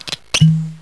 plop; svencoop; toonrun

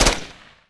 mp5 burst; firearms

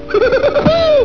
laugh

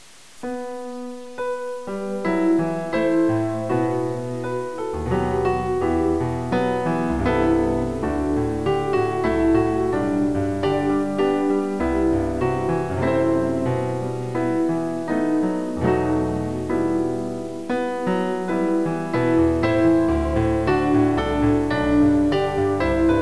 Piano Roll; svencoop

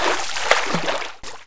player swim

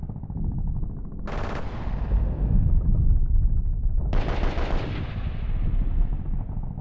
fx firefight

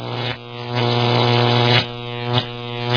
zapper; dmc

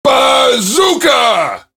cheer; svencoop; voice commands; SeriousSam

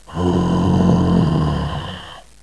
hummmmmmm, zombie; brainbread

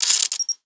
camera; cstrike

